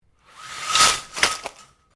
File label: Blinds Venetian Drop, Household blinds; Living Room